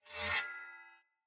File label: Secondary Dagger Whoosh Accent Heavy Long; Weapons; Player